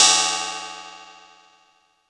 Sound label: Cymbal FX